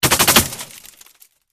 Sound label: Bullet Impacts Metal